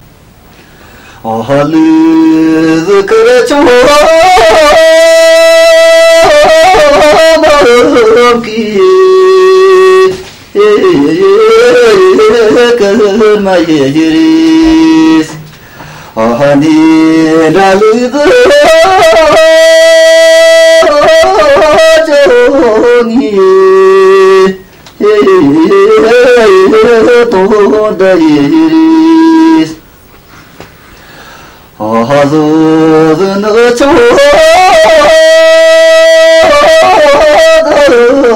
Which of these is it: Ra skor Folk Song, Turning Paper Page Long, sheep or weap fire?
Ra skor Folk Song